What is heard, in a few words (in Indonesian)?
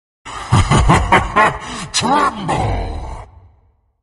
Nada Dering; Mobile Legends Helcurt Trumble